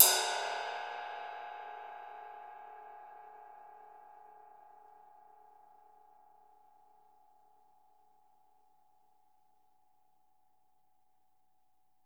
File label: RIDE, Drums Percussion